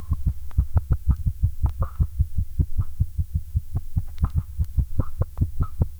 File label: soft tense bed heartbeat